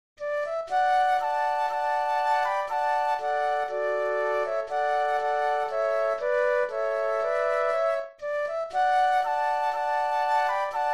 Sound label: flute trio